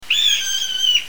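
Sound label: buzzard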